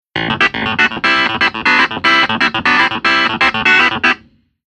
keyboard clavi amp

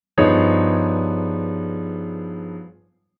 Chord Tension Dominant V7 9 11 13

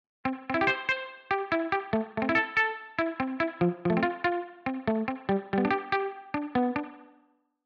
guitar pattern 2 gt

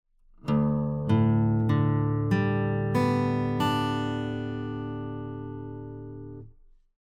guitar Drop D

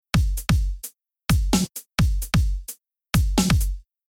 Rhythm Breaks Loop; Midi Only

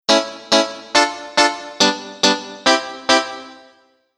Chord; ABILITY

